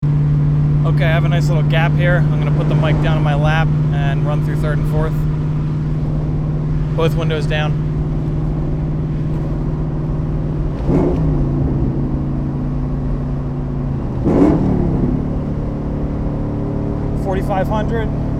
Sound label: 4k - 6k, Cobra BBK Longtubes